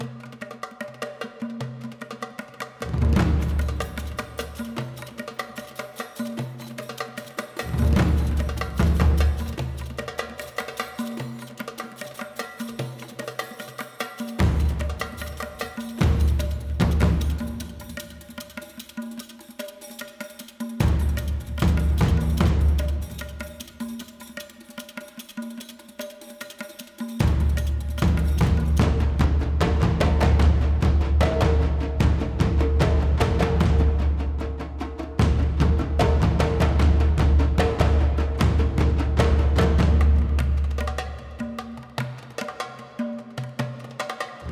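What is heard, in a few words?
Percussion loop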